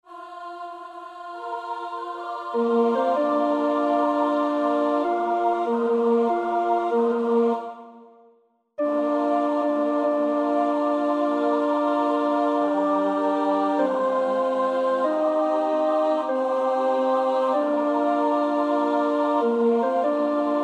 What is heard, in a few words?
Hodie, Christus Natus Est, tenor; Willan (Healey)